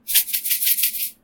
salt shaking quick; ragemap2023

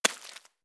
footstep slippery; fallguys

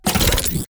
rhino load attack; fallguys

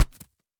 impact plastic; fallguys

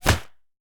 body hit; fallguys

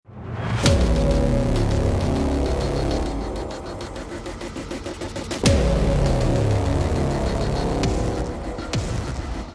suspense intro; ragemap2023

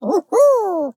player victory; fallguys

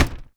impact; fallguys

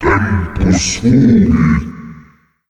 spores; ragemap2023; wizard

